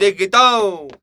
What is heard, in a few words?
flamenco vocals, male; Best Service ProSamples vol.46